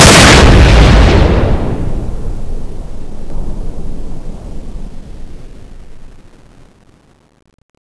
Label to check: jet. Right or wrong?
Right